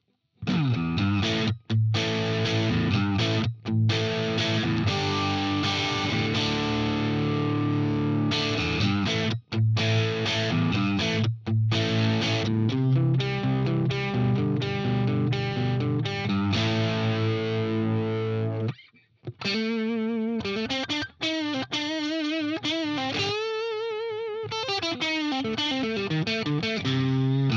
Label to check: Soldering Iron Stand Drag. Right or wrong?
Wrong